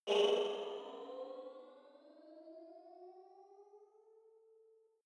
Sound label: sci-fi vehicle turbo activate modulated; raumschiff